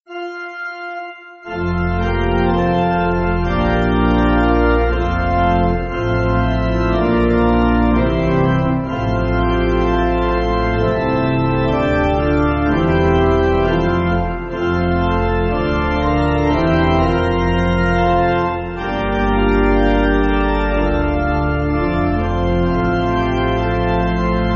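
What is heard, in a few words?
Sanctus Pipe, Pipe Organ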